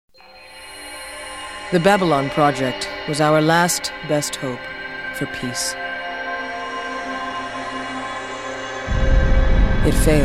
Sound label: Main Titles with Narration